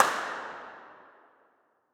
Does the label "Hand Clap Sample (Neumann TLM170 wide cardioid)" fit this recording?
Yes